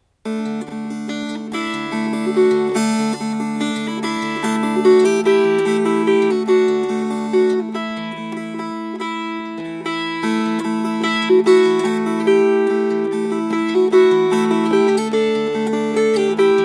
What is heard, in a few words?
Fingerpicked